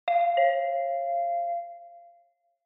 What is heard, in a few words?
Ticket Gate Alarm Timbre2 Far